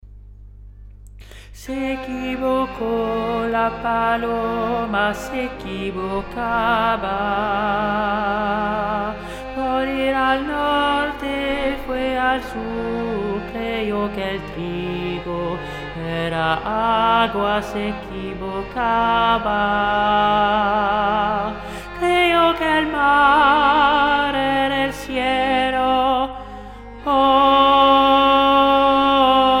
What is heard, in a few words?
tenors; se equivoco